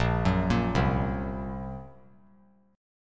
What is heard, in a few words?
Bb Suspended 2nd Sharp 5th; Bbsus2#5; arpeggiated; JGuitar